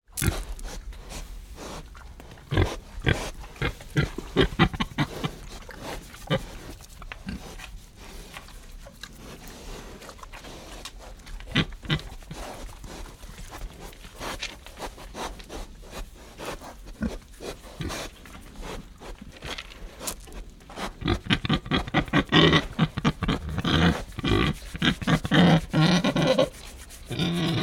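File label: animals pigs tamworth eating grunting breathing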